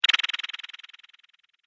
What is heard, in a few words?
Vibrating Wobble Laser; Andrew Fleming